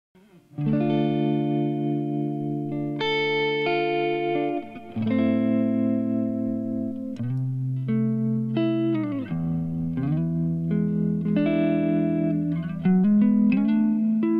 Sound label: 85BPM Am BACKING TRACKS